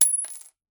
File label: Coin Drop Concrete Floor